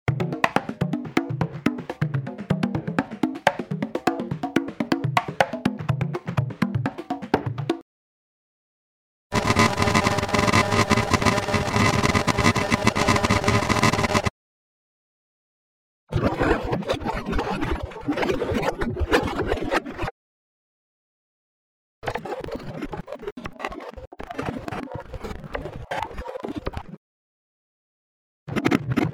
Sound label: Percussion